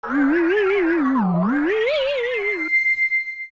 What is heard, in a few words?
Transform Off Complete; Transforming with a mask (also includes thunder and warping sounds)